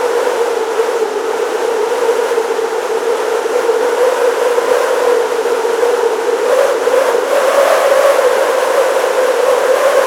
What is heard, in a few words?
Upper Wind; Kahlo